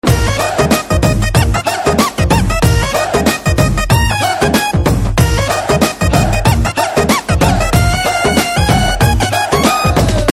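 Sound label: Fun background music Telugu Ringtone; F3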